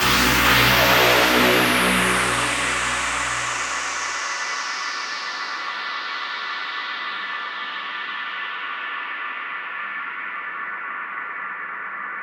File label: Trance Sweeps; FX; Vengeance Samples